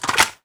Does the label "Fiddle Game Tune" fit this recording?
No